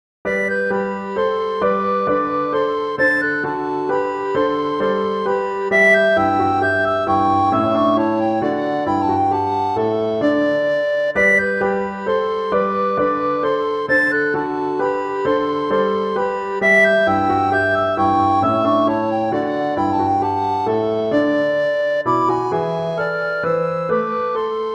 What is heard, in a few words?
minuet and trio recorder duet; Mozart